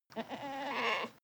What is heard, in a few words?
goat baa muffled male